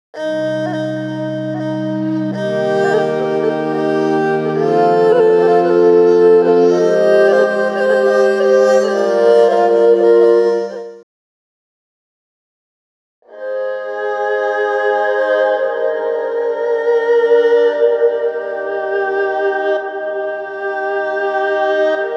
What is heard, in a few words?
Bowed Strings Presets; Yangtze